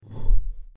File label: throw; coconut shy